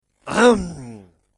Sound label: correct; comparison of numbers